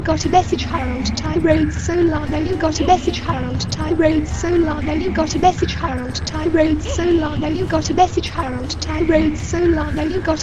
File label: Hahaha